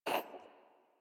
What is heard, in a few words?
Kitten Cough Hairball; Warframe; Catbrow